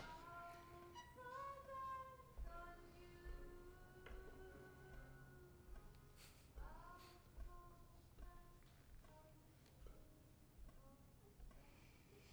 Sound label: violin